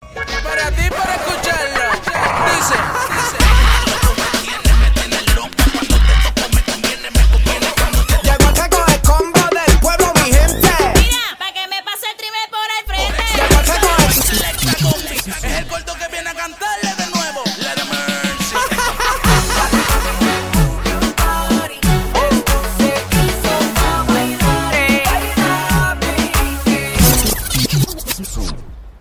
Rmix

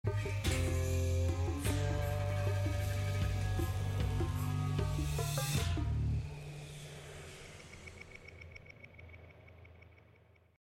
Jingle End

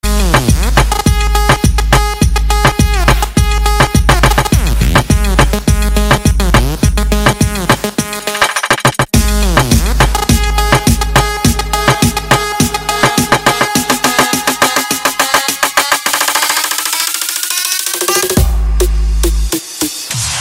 Trap

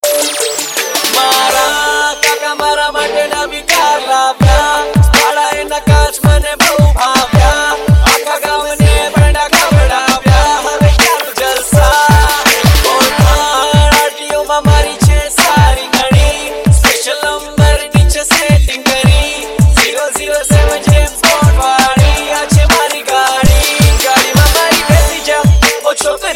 funny amplifier

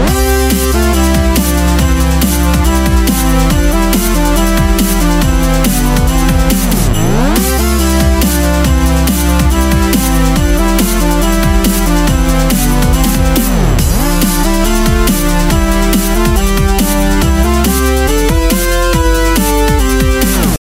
Xmas Beat

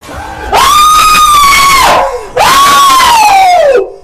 Screaming Guy

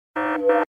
Cisco Bass

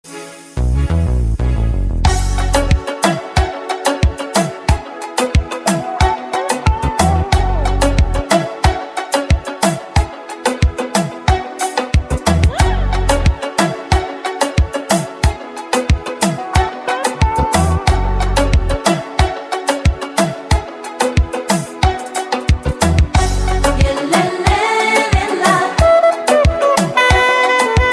kizomba mix; latino

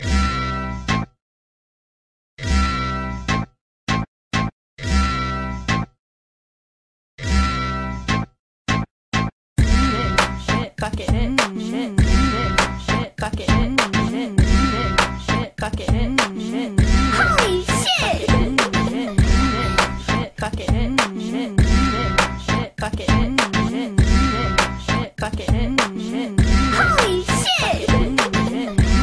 gangsta beat; hip hop

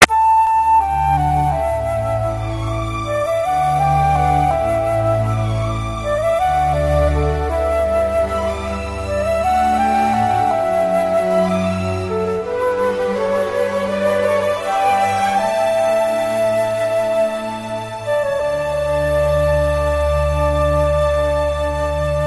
flute; classical

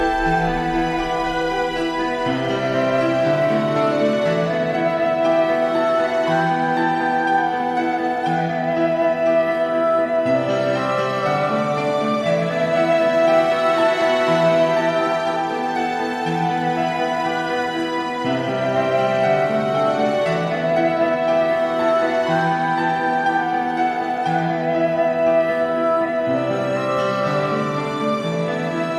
Medieval Song

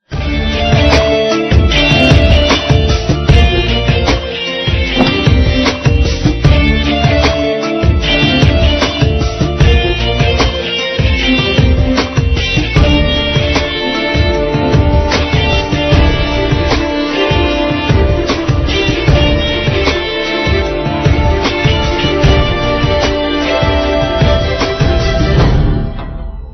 bg music

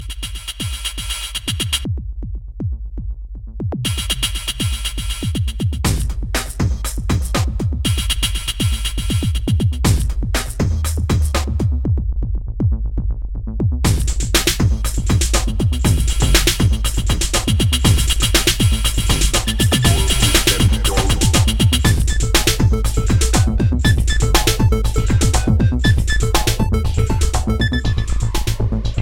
amazing beat; hip hop

